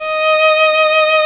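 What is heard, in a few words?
violin D#5 right; Greytsounds VOL-1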